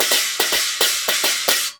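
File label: CHINA, China cymbal; Spectrasonics; Heart of Asia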